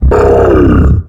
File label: biomech vce hurt